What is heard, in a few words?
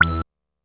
Synth Hit E; Zero-G Trance Inducer; 90 s Sample CDs